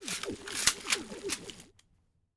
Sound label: explosive chemical crossbow lo